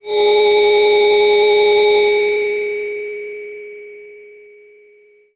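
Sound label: Breath V DX100 G#3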